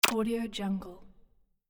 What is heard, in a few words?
button